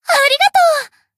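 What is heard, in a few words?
attack; asuna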